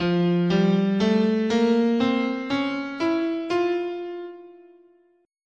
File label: F Harmonic Major, scale